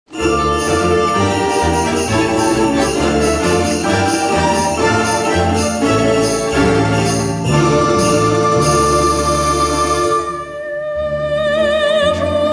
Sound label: Medley of Russian Folk Melodies, Balalaika